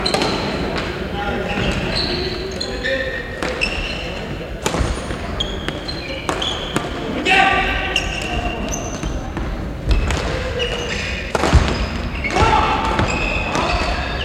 gymnase

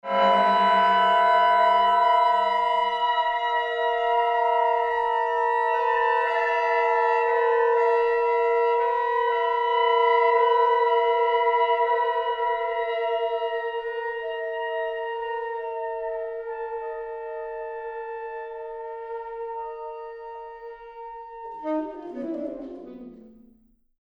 sirenes

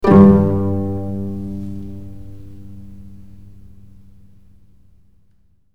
attq resonance

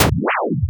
tchouiiou asc desc

